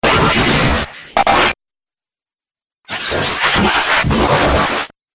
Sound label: Dubstep Scifi Effects